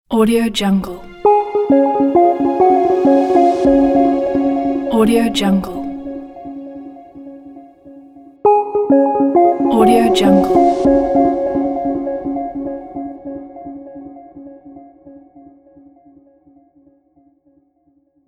elegant corporate logo

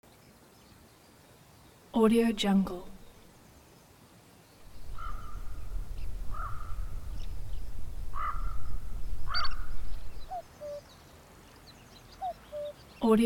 summer forest

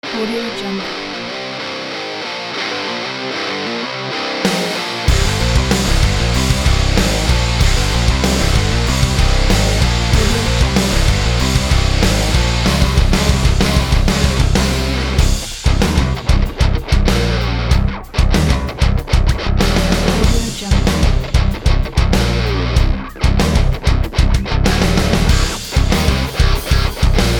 heavy metal fighting; musicfactor

